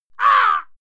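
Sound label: female fight pain heavy, screams; schreie; menschen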